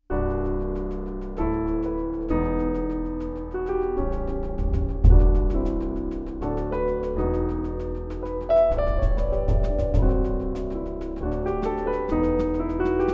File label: Optic Distortion